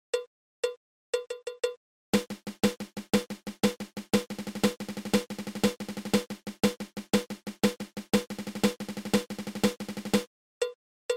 Five Stroke Roll Triple

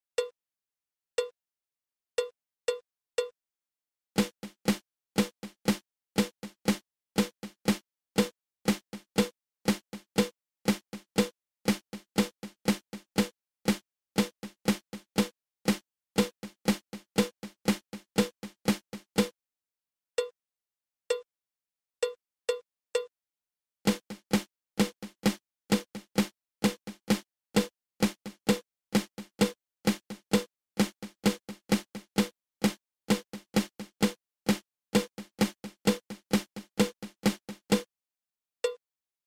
Inverted Flam Tap

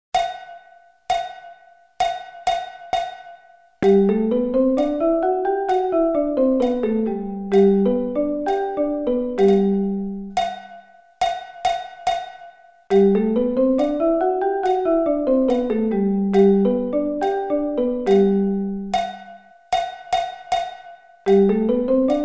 G Scale

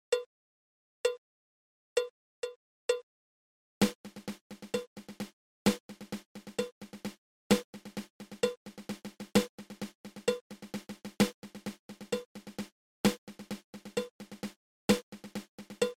Triple Ratamacue

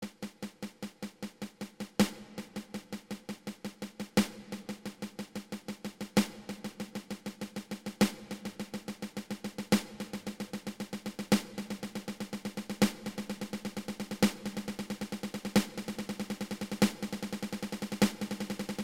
Eleven Stroke Roll open close open

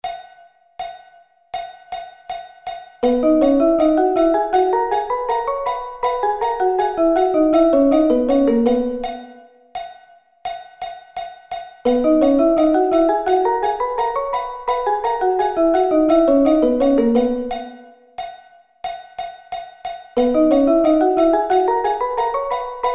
B Thirds

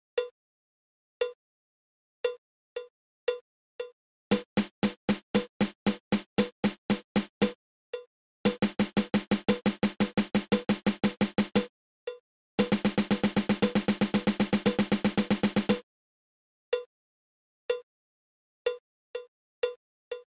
Single Stroke Roll

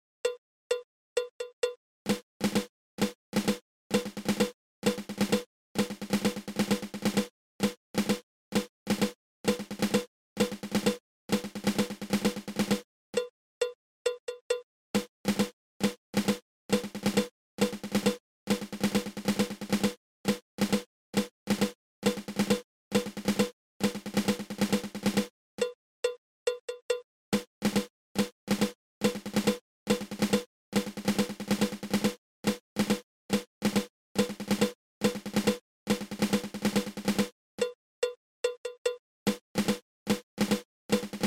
Patafla fla

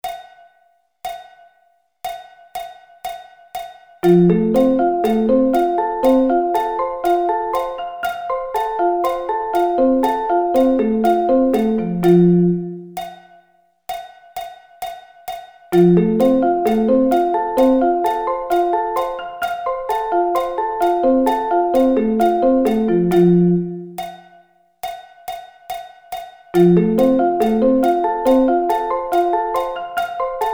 Arpeggio Inversions

